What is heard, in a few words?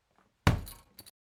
Boxing Kick Owi